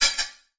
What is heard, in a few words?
Multimedia Internet CD-Rom Flash Click Multiple